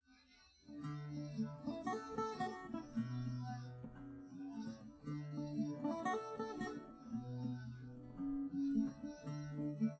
no drums live rec; SiSEC10; underdetermined

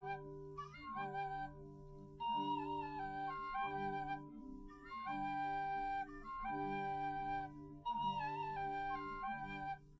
nodrums liverec 250ms 5cm; SiSEC11; underdetermined

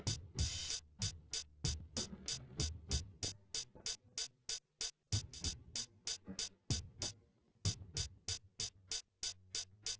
wdrums inst sim

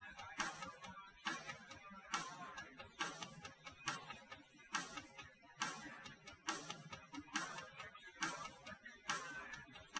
wdrums, liverec, src; SiSEC08; SiSEC underdetermined

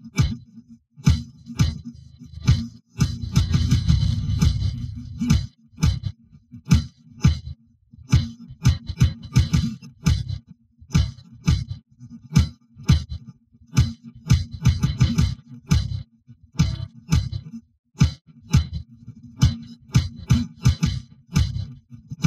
drums; SiSEC11

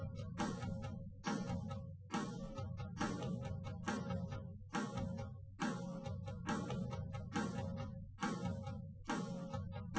wdrums liverec

